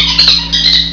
Ferret

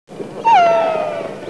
Whale

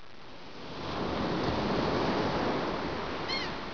Crow

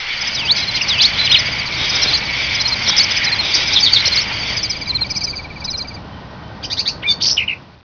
Intruding Preditor

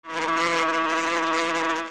Bee

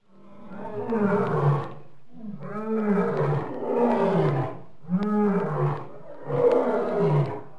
Lion Moving

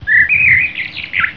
Bird

